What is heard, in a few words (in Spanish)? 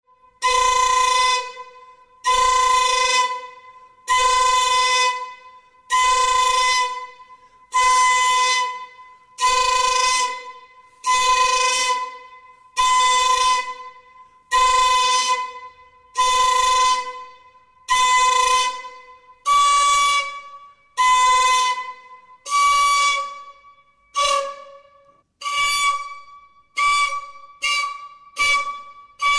precolombino flauta aconcagua; musica prehispanica de Chile